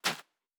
Footstep Sand Running

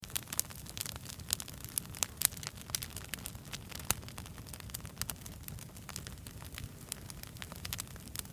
Big Fire; Ian's Fire Pack Universal; ToriaAssets

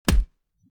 Weapon Hit; Character Sounds; Attack Hit

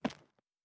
Footstep Concrete Walking; Character Sounds; Steps; Ratel; ToriaAssets

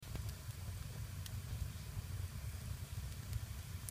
Hiss

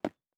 Footstep Concrete Running; Character Sounds; Steps

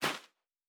Footstep Sand Running

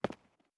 Footstep Concrete Walking

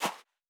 Footstep Sand Walking

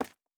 Footstep Concrete Running

